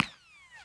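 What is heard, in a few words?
ricochet; weapons; tusken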